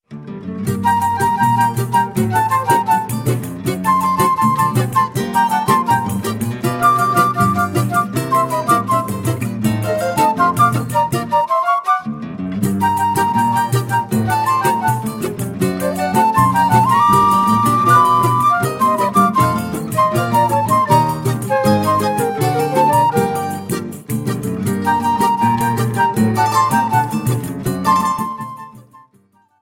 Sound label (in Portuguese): flauta e sax